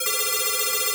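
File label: telephone; Greytsounds; F2-SYNCLAV 2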